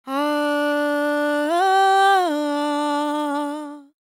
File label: World Voices, INDIA; ILIO; Vocal Planet